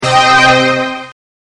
Emergency

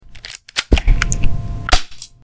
Plastic Pellet Pistol; Sanus Excipio

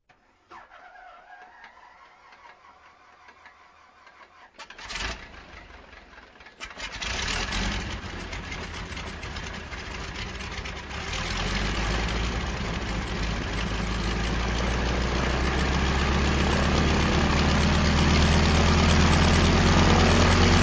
Prop Plane Start Idle

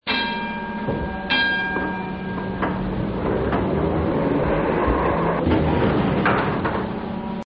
Train Takeoff

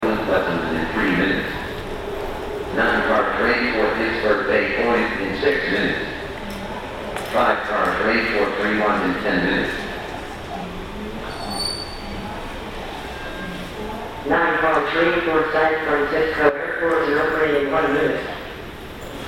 Train Arriving; Sfindigo; Bart

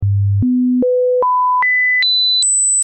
Cassette Tone; Rhumphries

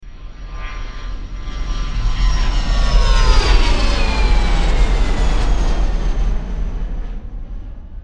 Plane Pass By

